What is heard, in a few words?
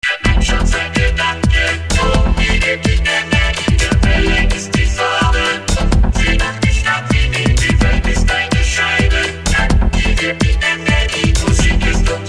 electro